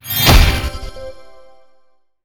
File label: simultaneous reels stop